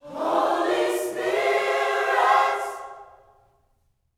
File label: H SPIRIT, Gospel